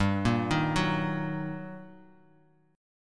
G Minor Major 7th Sharp 5th, down, Bass Standard; GmM7#5 arpeggiated; JGuitar

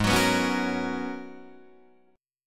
G sharp Minor 11th G sharp Guitar Drop D, chord

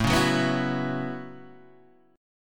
A 6th Add 9th A down Guitar Open D

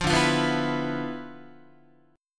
D Minor 6th Add 9th down Guitar; Dm6add9 strummed; JGuitar

